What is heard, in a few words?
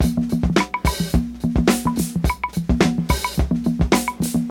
107 bpm hq breakbeat a sharp key; drum loops